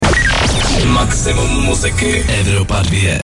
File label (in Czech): jingl